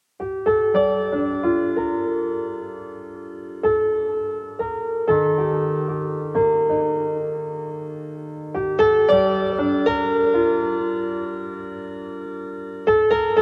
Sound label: piano music 432 HZ; SUBTELNIE WSPOMINAM